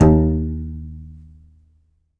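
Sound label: contrabass balalaika, Plucked Strings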